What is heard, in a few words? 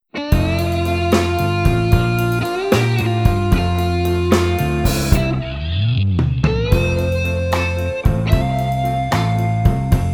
Leather Jacket Blues